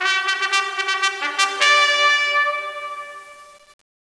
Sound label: fanfare; Caesar III